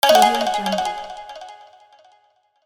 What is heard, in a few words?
asian bonus